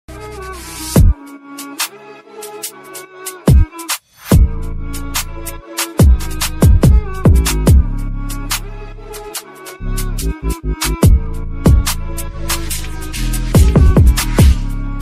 Instrumental

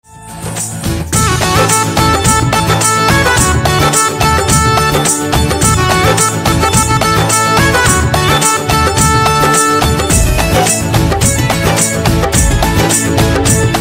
Instrumental Ringtone

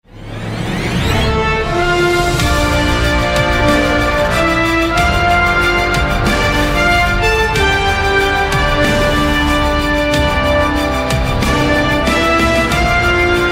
Orchestra; PUBG; Erangel

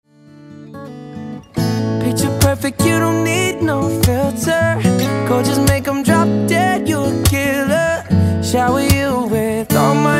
Acoustic

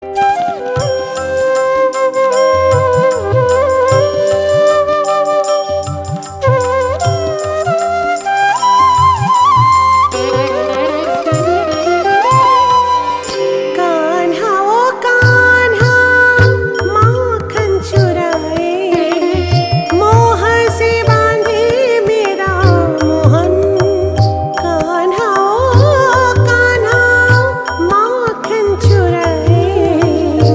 Bhajan

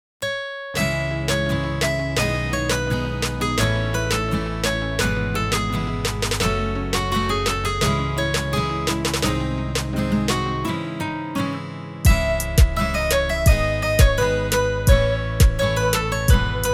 guitar

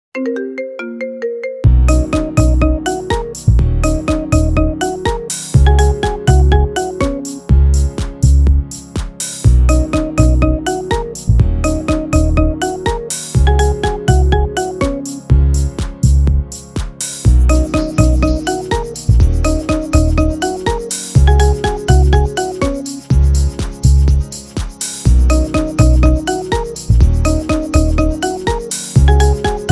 Marimba Remix

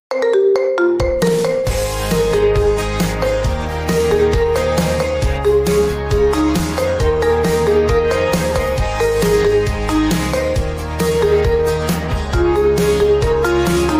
Marimba Remix

